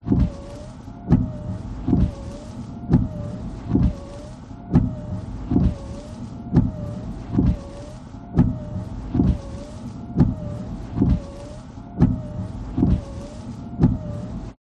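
car windshield wipers run med internal engine off toyota rav4; vehicles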